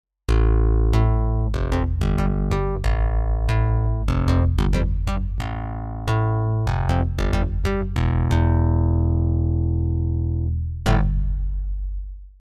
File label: Bass guitar